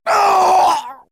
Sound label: character dying